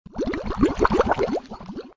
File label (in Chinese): 洗碗